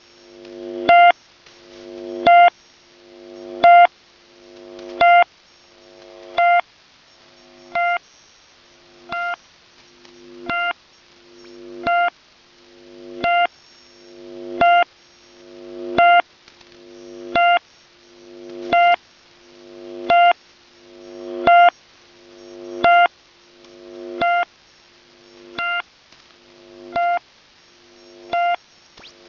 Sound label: usb; number stations